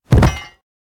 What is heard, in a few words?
bodyfall; smite; olympus